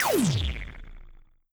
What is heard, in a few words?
laser gun high powered